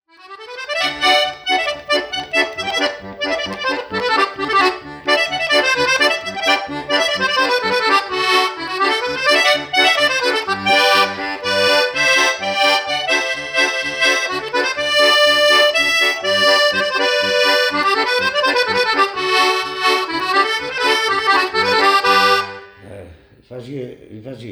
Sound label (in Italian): polka